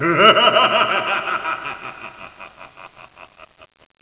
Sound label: huhuhaha, evil laughter